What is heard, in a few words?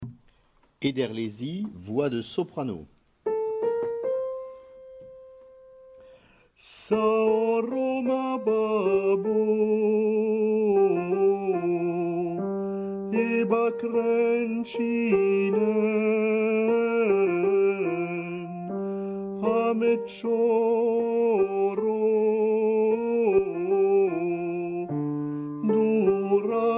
soprano; Ederlezi